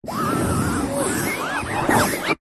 foley fabric pointed object scrapes fabric over hard surface whizzing sound; zapsplat